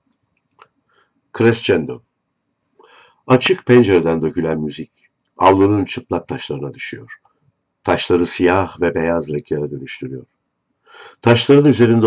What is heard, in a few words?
crescendo